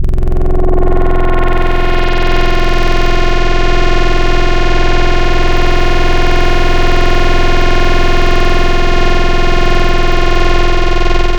One Shot BS Elephant, synth; Synth One Shots; Synthetic's Serum Collection; Echo Sound Works Core Tables

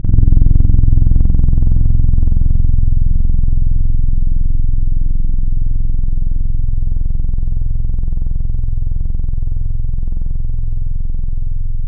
Piano Felt; Keys; Synthetic's Serum Collection; ESW X Keys

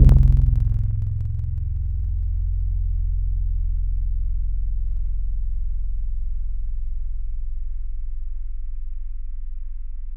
Digital Koto; Synthetic's Serum Collection; Echo Sound Works Sphere

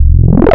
303 Acid

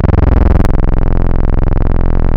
Synthetic; Synthetic's Serum Collection; Void Serum Bank - Tables

